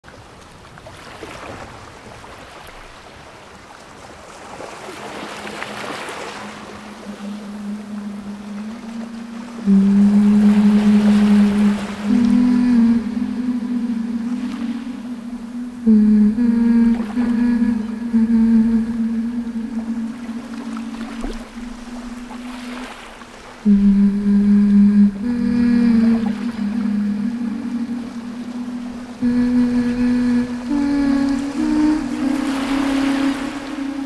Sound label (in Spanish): Canto de Sirena Cancion, Humming with Ocean Waves